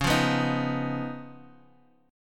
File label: D sharp 7th Suspended 2nd Sharp 5th